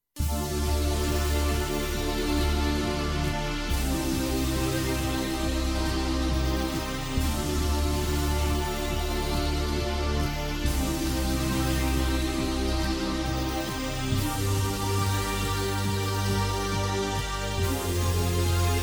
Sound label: Strange Game Menu